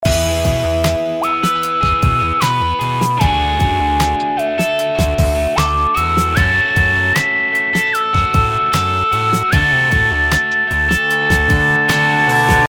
synth recipe

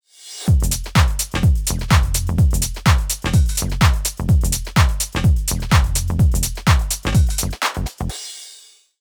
kicks

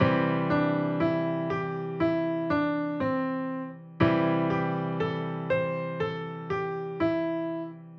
piano

